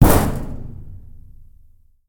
metal crate drop

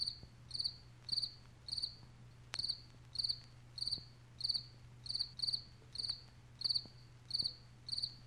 birds chirp

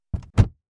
car door close

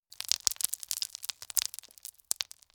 sand rocks falling; beach

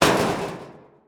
water pipe hit, house drainpipe hit

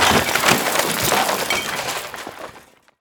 debris crash

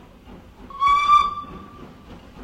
train horn; warning

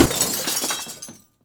window smash

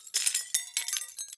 glass debris

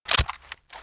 UZI reload; submachine gun reload

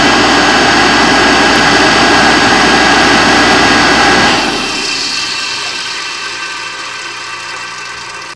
industrial generator stop

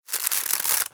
paper bag open

